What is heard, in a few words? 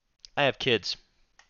kids